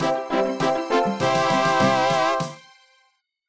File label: level up; Attack